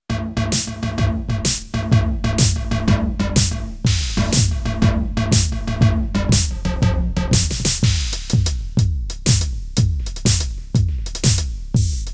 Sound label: SIMMONS SD200 Kit 11 Tekno